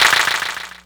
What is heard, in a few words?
Clapbox Pitch16; Ableton Foundation FreePacks